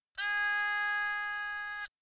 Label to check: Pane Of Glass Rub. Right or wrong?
Wrong